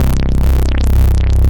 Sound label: Dark and Brash, Bass; Instruments; Basses; Ableton; Creative Extensions